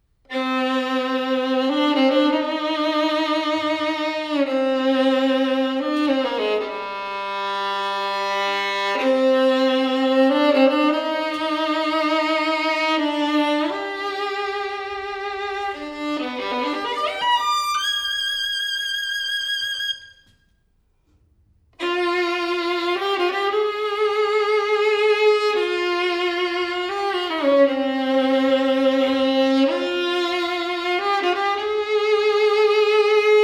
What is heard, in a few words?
Violinsolo; Jeno Hubay; Alla Ungarese